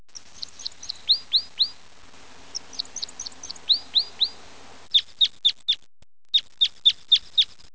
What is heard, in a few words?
loxia curvirostra